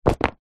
foley clothes football soccer boots drop on concrete ground